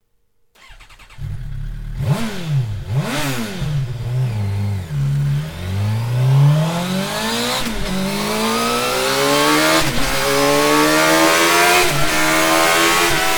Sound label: stock exhaust suzuki gsxr 750; akrapovic